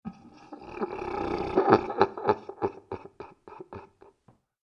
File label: horror demonic laugh; Demonic Laughter; Evil Laughs; McKinney Sound